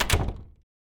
door close

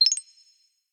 decision, button

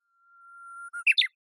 horornis diphone twitter

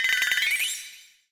flash

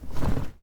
washing machine laundry put

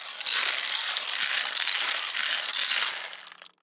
lottery machine

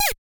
cancel, button

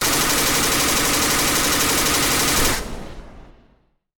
machinegun

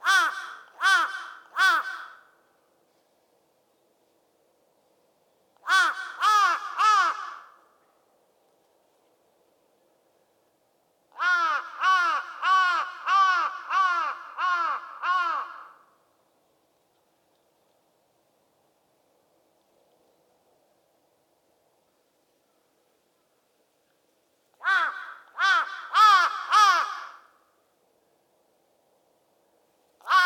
evening crow

